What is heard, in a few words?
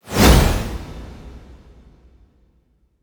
teleport